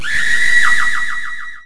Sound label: Cry